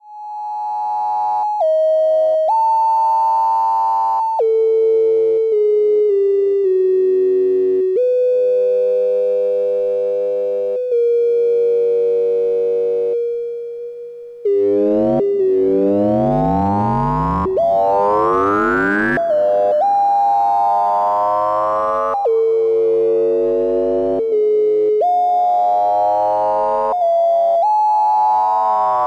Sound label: delay mod module, analogsynth